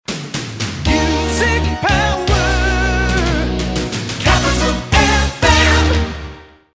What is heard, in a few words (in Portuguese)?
Vinheta Music Power; Radio Capital FM